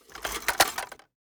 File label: box remove, m60; weapons; Urban-Coalition; Anarchy; arccw ue